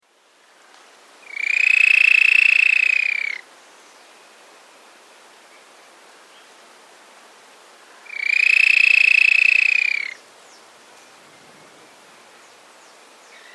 Caatinga Antwren, Nature's Songs, S. America

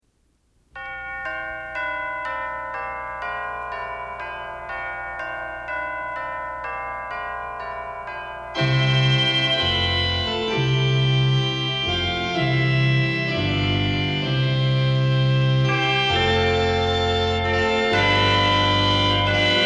Christmas Organ & Chimes; Joy To The World

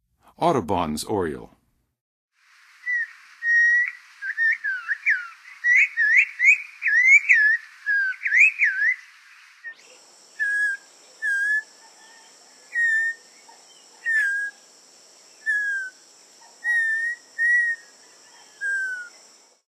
Audubons Oriole; North America; Western USA; Western USA Songbirds

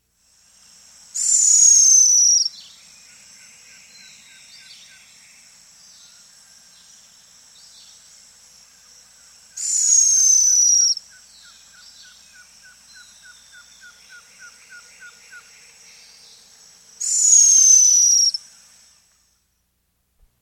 White-Whiskered Puffbird; S. America

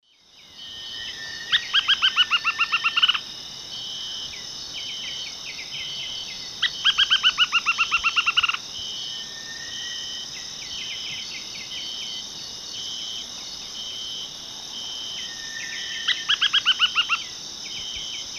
Synallaxis albigularis, song; S. America; Eastern Lowlands

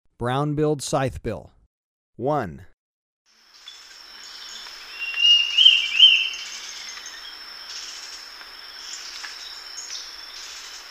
Brown-Billed Scythebill, Birds of Ecuador; S. America

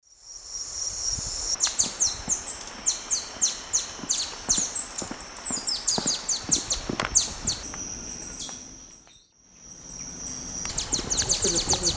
Topaza pyra, calls; S. America; Eastern Lowlands

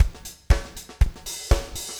4 on floor 120, loops, 120bpm

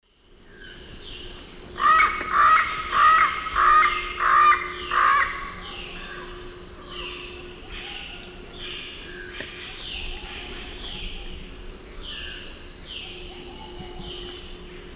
Amazona ochrocephala, calls; S. America; Eastern Lowlands

